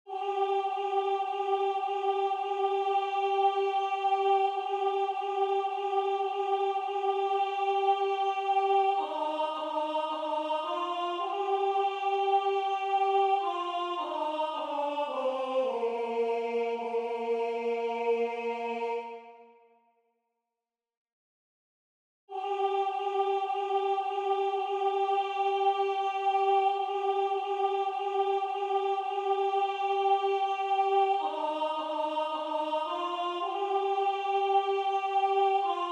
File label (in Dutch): koor Alt